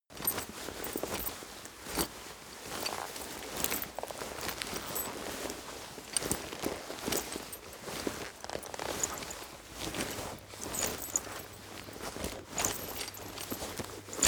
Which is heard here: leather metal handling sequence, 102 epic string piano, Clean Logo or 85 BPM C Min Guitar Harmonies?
leather metal handling sequence